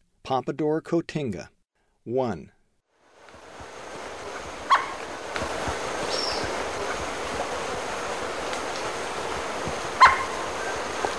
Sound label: Pompadour Cotinga; S. America; Eastern Lowlands